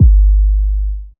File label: Boom Kick; Roland MV8800; SONIQ DRUMS